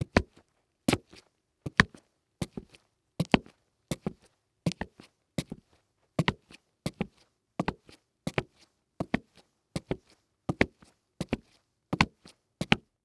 Foley Footstep Work Boots Concrete Walking; Foley Footsteps

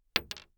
Weapon Bullet Shell 9mm Drop On Solid Wood; AdobeCC

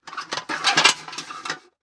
Foley Paint Can Squeak

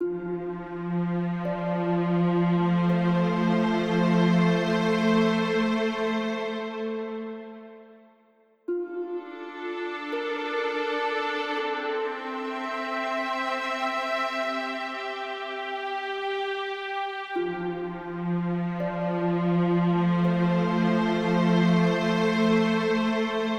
Slow 83BPM, Cinematic Trailer Music; BlueFX

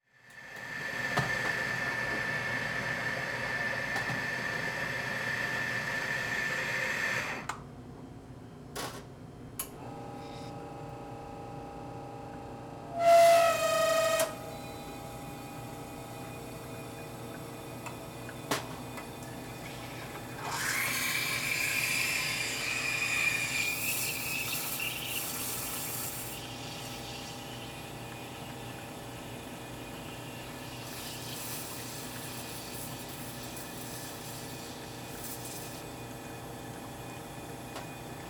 Household Appliance Espresso Machine

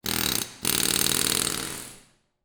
Industry Tool Air Ratchet Operating

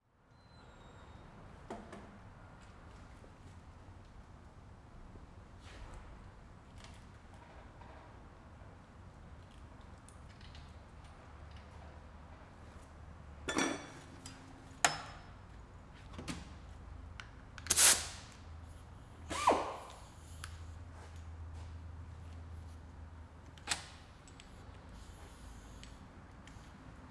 Ambience Transmission Shop; AdobeCC

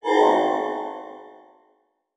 Multimedia Internet CD-Rom Flash Whoosh

